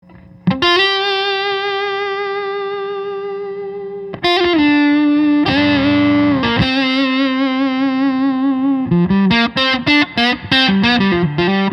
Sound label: Gibson SG, guitars; 1969; mahogany; electric guitars; solid body